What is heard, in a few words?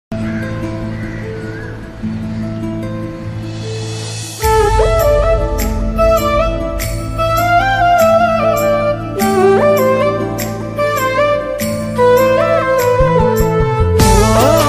odia best bgm ringtone odia movie ringtone; mobile caller tunes